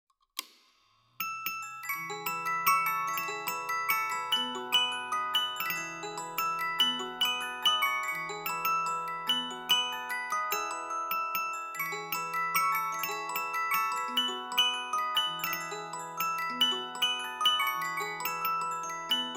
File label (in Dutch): Speeldoos; Sonokinetic